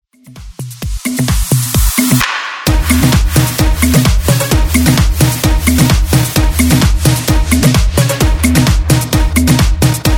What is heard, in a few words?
Booty House Rework